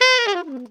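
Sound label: ALTO short fall C 4, Sax; Giga Samples Collection; ALTO SHTFALL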